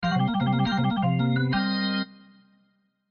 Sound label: Organ Funk Riff, Organ Funk Riff Fill; Keyboard; Blastwave